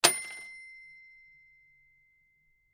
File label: Articulated Sounds Peal Cash Register Bell Ringing